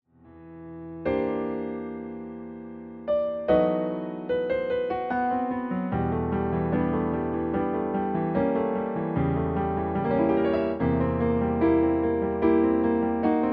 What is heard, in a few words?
Allegro non troppo practice, Flute; York Bowen; Flute Sonata; Op.120